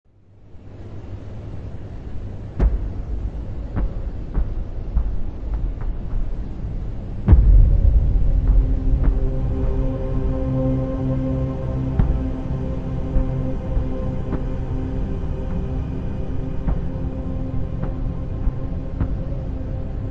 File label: Norse Ambient heartbeat sfx; Nídhögg; Space Whale